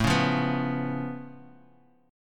C sharp Augmented 7th, A

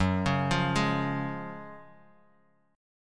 Gb Major 7th Suspended 2nd

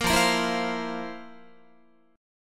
F Major 7th Suspended 4th F, Guitar; strummed

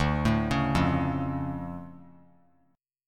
D Minor Major 7th, D; DmM7 arpeggiated; JGuitar

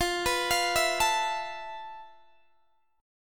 F Major 7th Flat 5th, F, down, Guitar, DADGAD

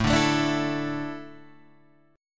A Suspended 4th, Asus4 chord; strummed; 5,5,7,7,5,5; JGuitar

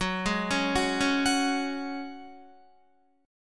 Gb Suspended 2nd, Guitar 4E,4A,5D,5G,5C,6F; arpeggiated

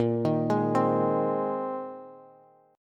Bb 9th Suspended 4th Bb down Bass; arpeggiated

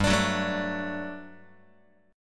G Major 7th Suspended 2nd G down Guitar; GM7sus2 strummed

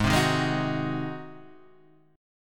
G sharp 7th G sharp

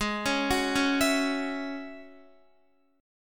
Ab 7th Suspended 4th Sharp 5th Ab down Guitar; Ab7sus4#5 arpeggiated

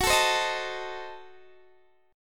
Gb Diminished 7th Guitar Open D

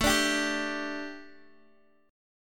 A 7th Suspended 4th Guitar DADGAD, chord; x,12,12,12,10,x

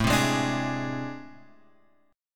A Minor Major 11th; {5 3 6 X 3 4} strummed

